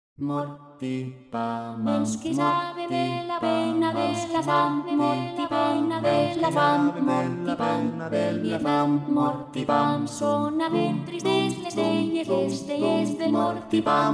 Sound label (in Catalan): SATB